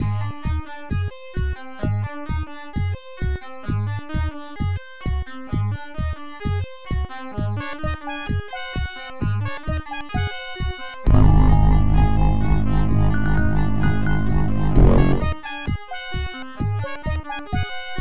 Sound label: Music; Dungeon Master II